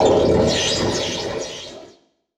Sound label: Title Transition Burst; Production Element Title Transition